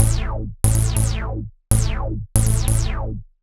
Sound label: ELECTRO BASS 140 A